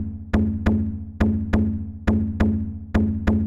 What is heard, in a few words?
LOFI TOM, Techno 138 BPM; Best Service ProSamples vol.54; AKAI; HI ENERGY